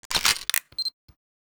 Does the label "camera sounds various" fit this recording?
Yes